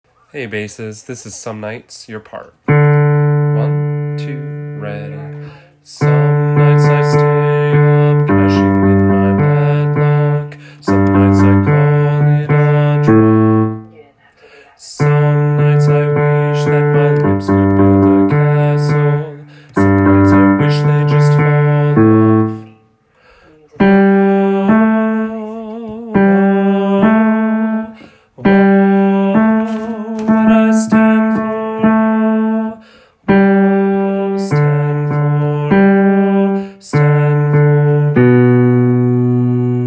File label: bass